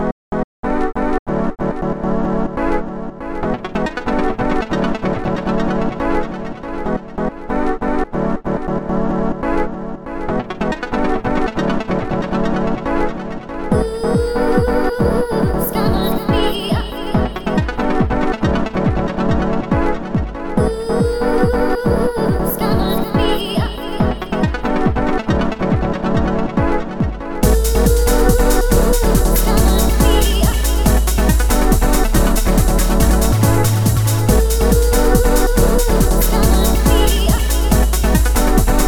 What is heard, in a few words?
Amiga Breaks